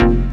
Synth Shots; Vengeance Samples

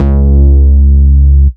Vengeance Ultimate Bass C#, Root C#

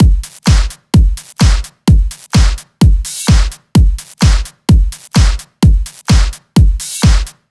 128BPM Kit; Vengeance Samples; One Stop Kit

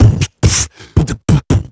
Beatbox Loops, Human Beatbox

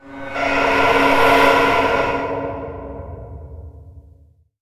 VFX Atmos

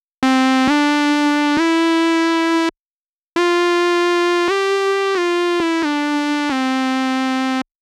134BPM Mainlead Outro DRY, Synth, Cmajor

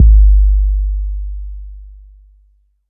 Bass Drop, 808; Street Anthems Sound Kit

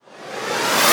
Short Fills & Reverse; Vengeance Samples

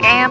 LoFi Vocals Oneshots; Vengeance Samples

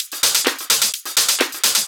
Houseloops 128BPM; Vengeance Samples

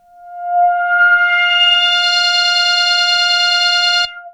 Arp C F5, ARP Odyssey; synth; musicradar-synth-samples

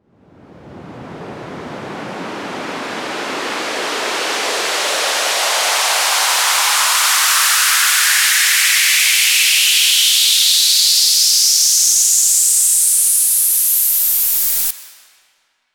FX Uplifter

